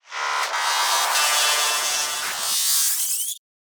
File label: Computer SFX long; SoundBits